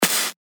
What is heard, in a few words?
FX Hat, cymbals; revealed cymbals vol 1